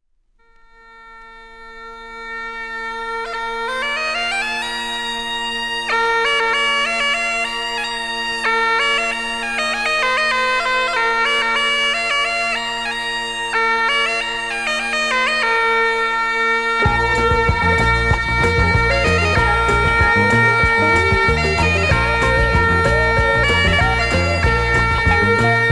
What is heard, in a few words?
Biniou pop